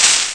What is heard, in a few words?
sand splash; Ferazel's Wand Demo